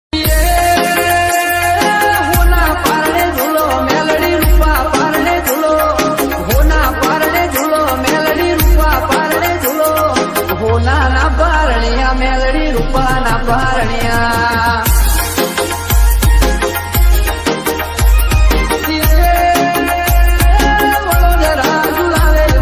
meldi maa ringtone gujarati ringtone; મલડ મ ગજરત રગટન

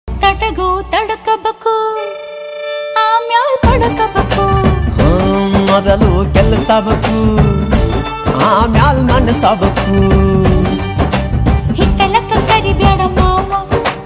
Kannada

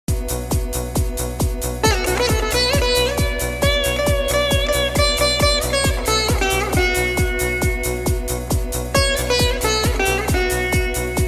song sitar bgm

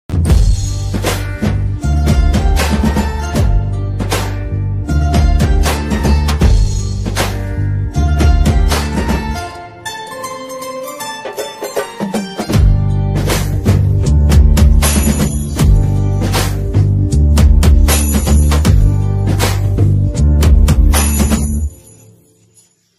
background music; abajipeta marriage band

